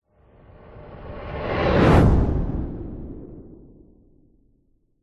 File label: science fiction spacecraft super sonic pass by zoom whizz